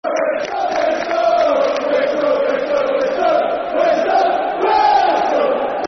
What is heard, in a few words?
Football Chants (Soccer Songs); We Are Stoke; Stoke City Scfc